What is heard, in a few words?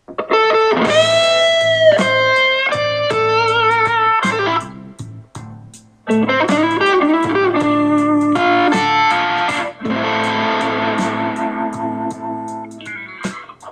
minor blues lick; golden nugget